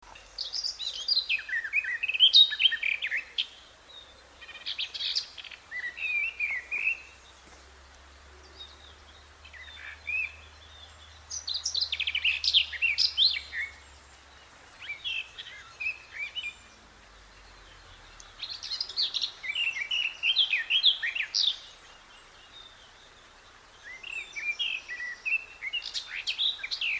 kerti poszáta hangja

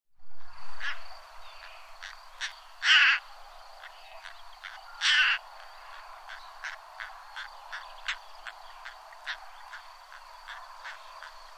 szalakota hangja

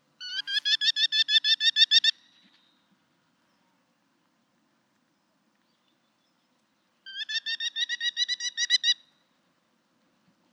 kis sólyom hangja

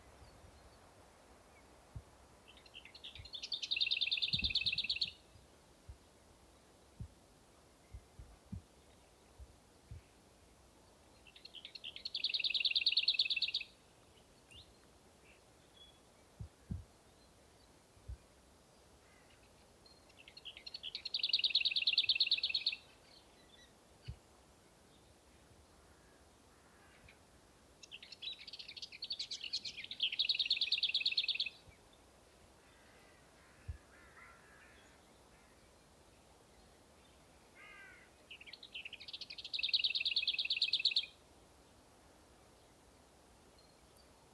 kis poszáta hangja

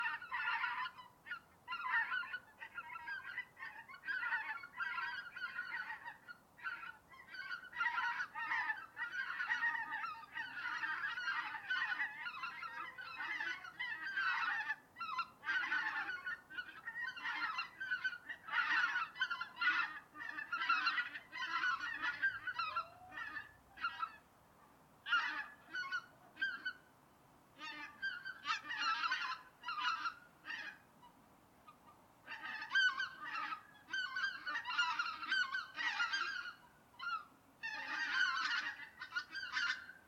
nagy lilik hangja

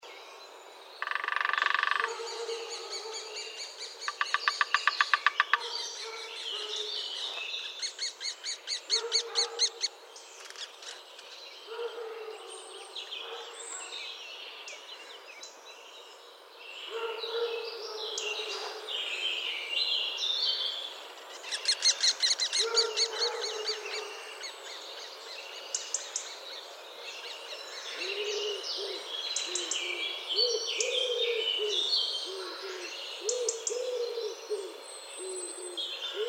kis fakopáncs hangja

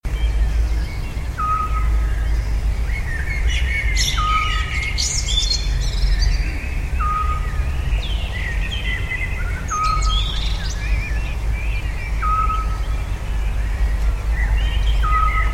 füleskuvik hangja

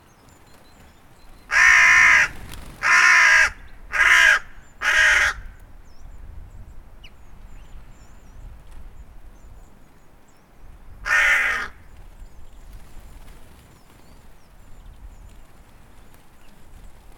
dolmanyos varju hangja

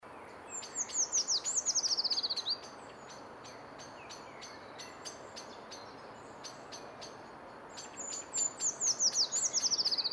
hegyi fakusz hangja Certhia familiaris; hosszukarmu fakusz

